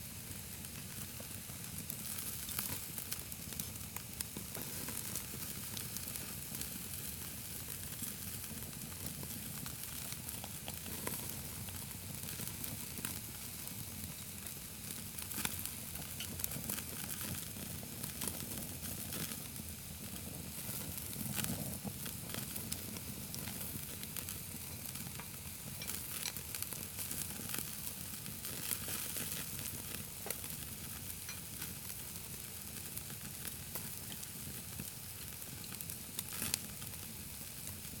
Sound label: Roasted Meat; LOOPERS